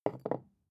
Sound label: glass put down wobble short